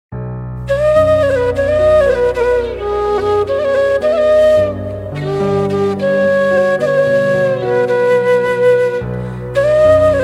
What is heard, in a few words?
Flute Instrumental Ringtone